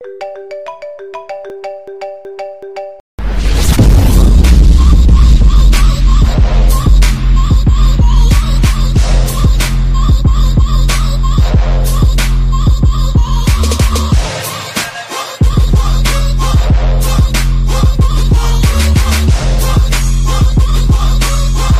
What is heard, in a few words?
IOS Marimba Remix